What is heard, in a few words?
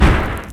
projectile impact; Keeper of the Light